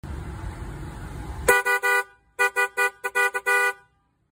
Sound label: fiam cadillac horn; فیام کادیلاکی